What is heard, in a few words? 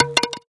Sfx Baseball